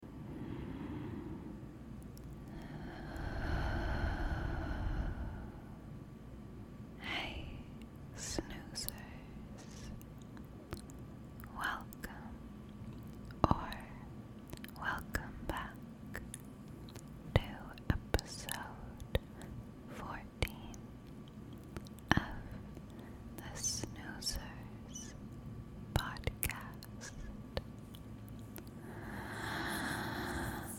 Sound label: frogs